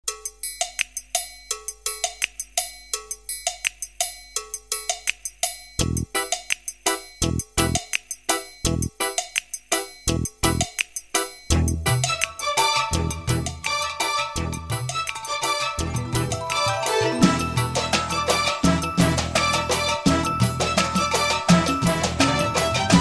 New Sms

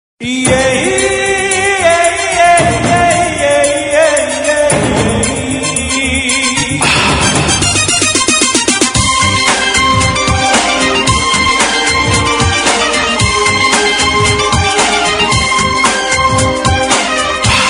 Music; Raaz

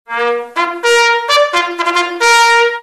Fanfare

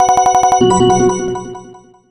Sms; Google

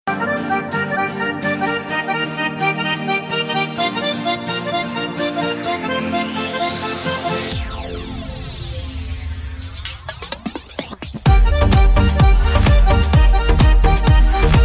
Electronick Acordeon

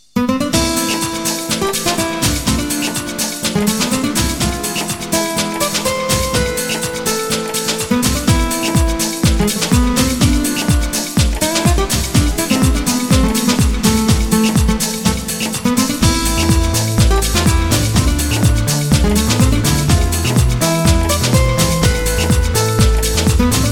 Latino Chill

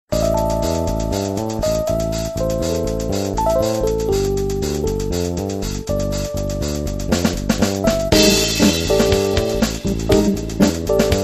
Nokia 6151; Stalker

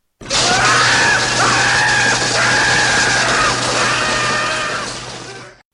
Horror Tone

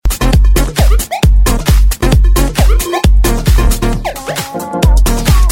Bass Loops Sms

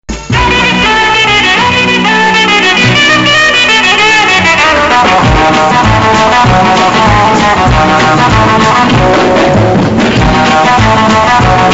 Gitar

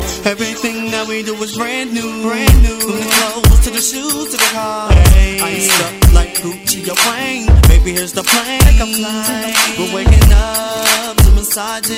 Ringtone

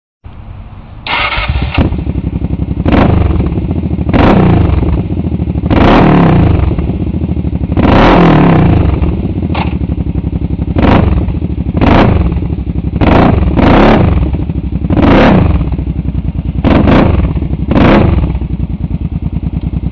Kawasaki Kfx700; meloboom

